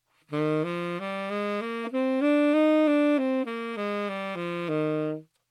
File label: Eb major scale, saxophone